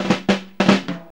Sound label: FILL 94, Funky Elements LOOPS; Zero-G